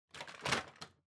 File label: Vacuum Cleaner Noise; 掃除機; ノイズ